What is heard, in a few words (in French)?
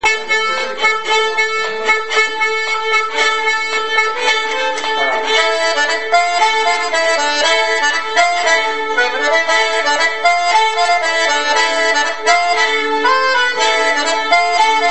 Bourree Marrakech (rythmique violons+accordeons); Musique d-ensemble